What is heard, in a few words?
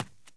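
run right, FOOT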